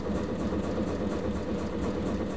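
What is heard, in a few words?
compressor loop, Train; OpenBVE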